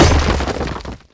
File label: Rage Blow